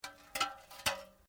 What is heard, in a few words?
Metal Light Movement Minor